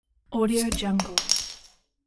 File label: opening beer bottle